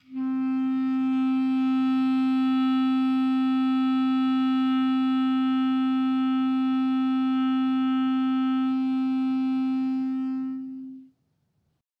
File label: clarinet maybe